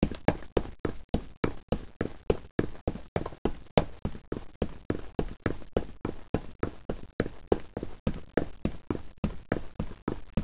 dash asphalt; easyrpg; WitchsHeart

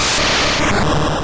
Thunder Punch; Pokemon Essentials; PKMN Estelar 1.3

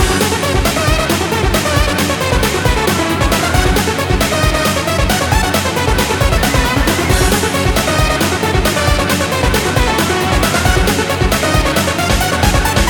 BOSS Loop, Music; Statue Of Tyranny; TMNT

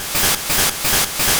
Fly Hit; Pokemon Essentials; PKMN Estelar 1.3

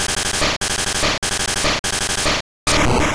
Rock Slide; Pokemon Essentials; PKMN Estelar 1.3

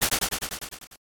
Battle flee; Pokemon Essentials; PKMN Estelar 1.3

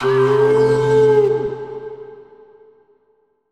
coyote; Pokemon Essentials; Pokemon Opalo

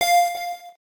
Battle ball hit; Pokemon Essentials; PKMN Estelar 1.3

